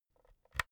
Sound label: insert usb b; objekte; elektronik